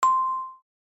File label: notification; SolarNetwork; Solian; Sfx on notification